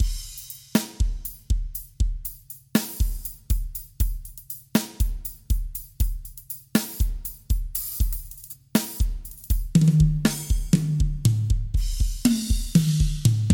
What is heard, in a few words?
SD Arrocha